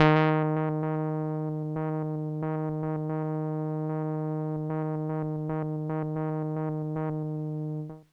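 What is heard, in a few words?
Kawaii D#5; keys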